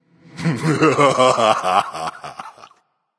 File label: laugh